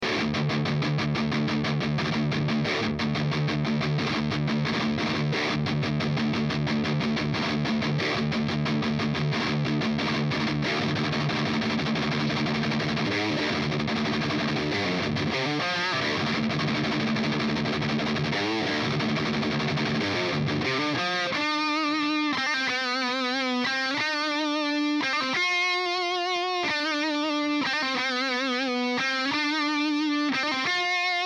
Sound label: Seymour Duncan TB4 JB JCM800